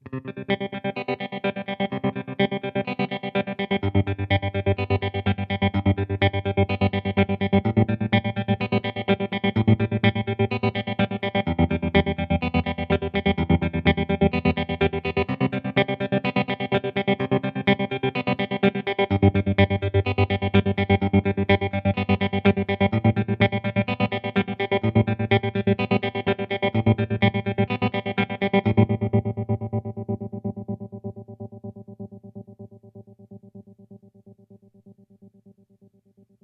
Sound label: Rhythm 2 - Square waveform. Full depth. Another example of the rhythm feature.; empress; tremolo